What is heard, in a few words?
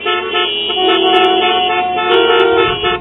city car horn